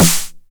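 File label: 909 SD Tape, snare drum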